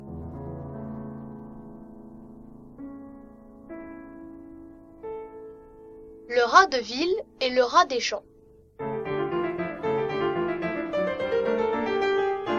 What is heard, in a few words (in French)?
avec piano; La Fontaine; Le rat de ville et le rat des champs